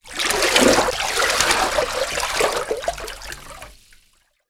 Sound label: water move